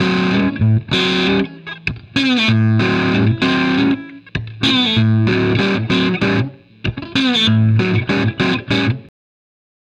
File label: BLUES GUITAR